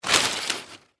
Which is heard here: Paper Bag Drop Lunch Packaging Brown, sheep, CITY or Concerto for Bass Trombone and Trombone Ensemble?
Paper Bag Drop Lunch Packaging Brown